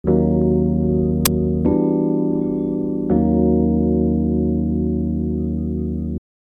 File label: rhodes